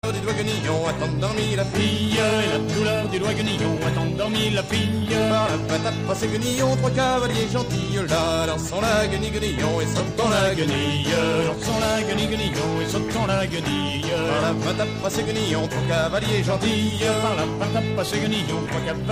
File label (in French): folk celtique